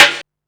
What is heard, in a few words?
snare, percussion; Single Drums